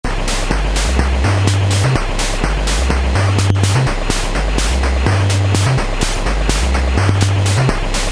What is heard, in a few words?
bonus loop; Dimitryghost; 3a Loops